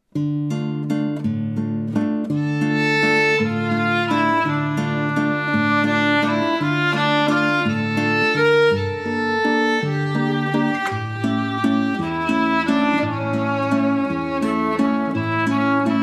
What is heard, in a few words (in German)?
Walzer Jazzsuite; klassik